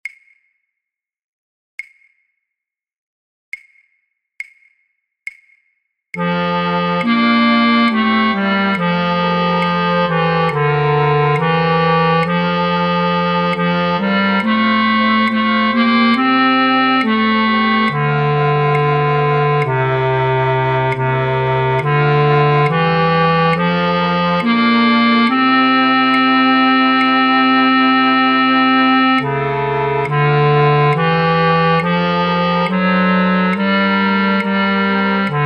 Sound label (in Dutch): Basfluit 1A, Basfluit 1, fluitorkest; Matthaus Passion